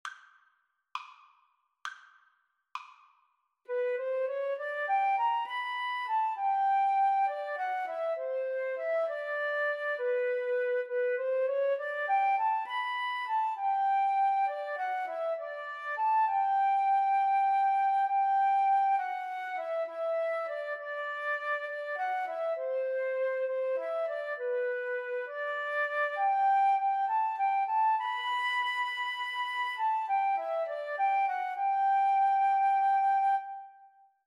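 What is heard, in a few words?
flute violin karaoke; Violin-Flute Duet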